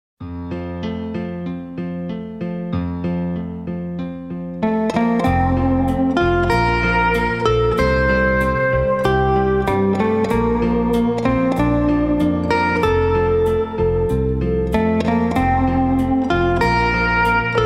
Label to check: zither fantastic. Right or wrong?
Right